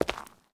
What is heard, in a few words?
zombie footstep concrete; DeadFright